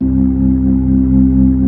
B-3, Keyboards

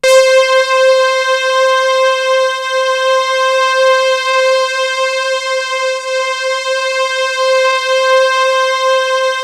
SYNTHLE, MORPHEUS

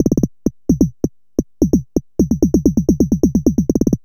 130 bpm

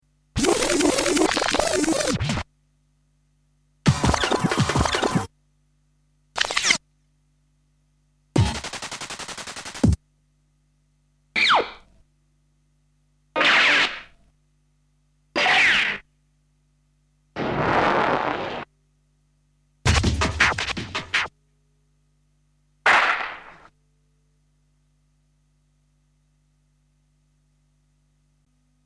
Sound Effects; Kniteforce; 1000 Samples Volume 1

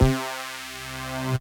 Synth C, Synth Prophet One Shots; Electronisounds

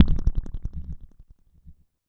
Feed Short

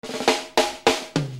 Snare Loop; Sonic Foundry (Sony Creative Software); Infinite Soul VOL-1; Beats

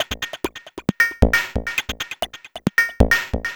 Percussive

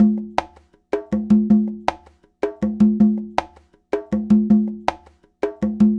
Conga, Latin Percussion

